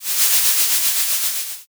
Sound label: torch